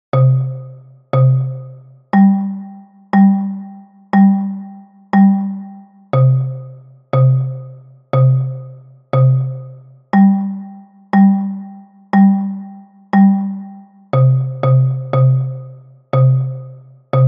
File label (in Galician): Xilofono Baixo